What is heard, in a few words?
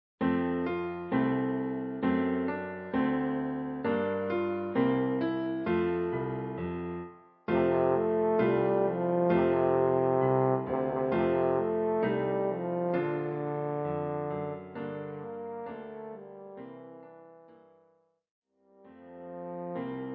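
Jingle Bells, Horn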